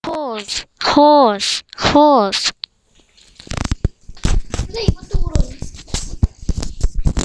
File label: hose